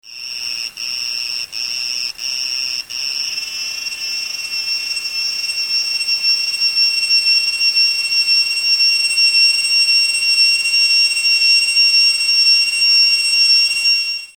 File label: Dundubia oopaga